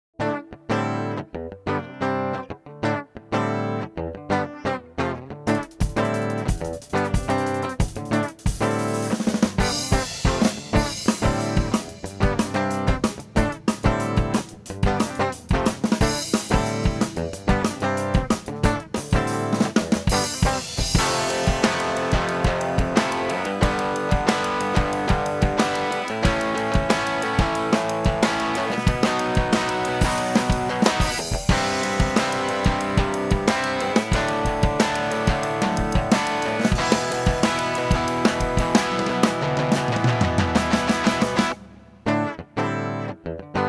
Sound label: Fast Rock